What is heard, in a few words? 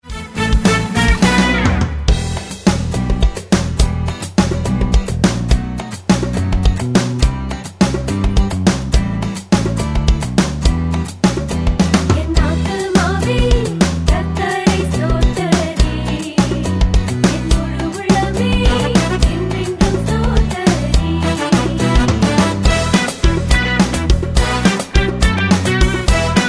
Karaoke